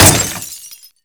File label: Sweetner Glass, impacts; Totaled; XBOX